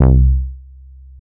Bass, 808s

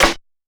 clap deep rim snare; Claps

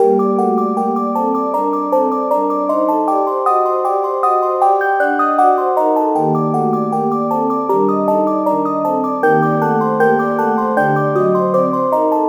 melodic loop arma Gmin

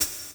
HH, Closed Hats

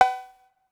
pick up, Perc

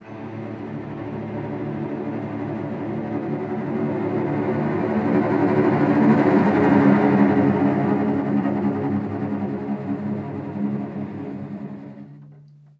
Loop

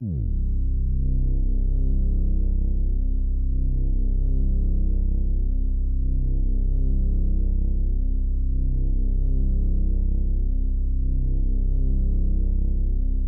Moog, 808s

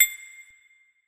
Random Perc

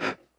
Breath Vox

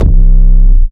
808 C; COMEWITIT

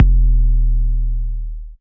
808's

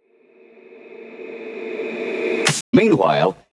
110 Talk Box

